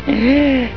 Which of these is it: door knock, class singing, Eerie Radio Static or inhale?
inhale